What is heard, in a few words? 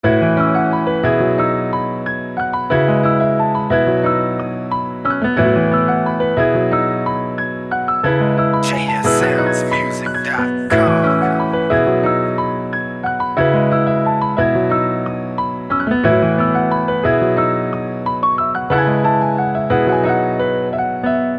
Piano Vibe